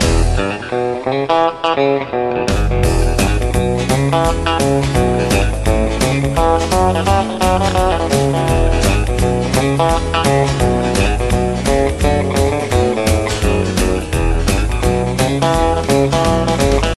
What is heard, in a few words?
Guitar Boogie Woogie